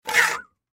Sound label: Hand Saw Scrape, Hand Saw Scrape On Wood Bend Blurp; Machines; Industry